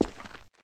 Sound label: concrete, player footsteps; hl2